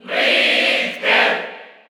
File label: Cheer Spanish; SSBU